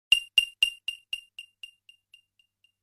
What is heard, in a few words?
short ping tone; ringtones; sms